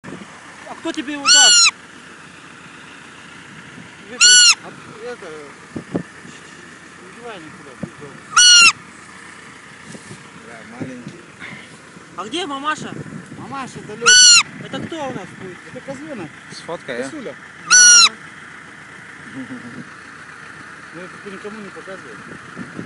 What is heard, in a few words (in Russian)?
Детеныш косули